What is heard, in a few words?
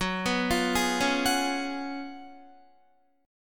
Gb Major 7th Suspended 2nd Suspended 4th, Guitar; GbM7sus2sus4 arpeggiated; JGuitar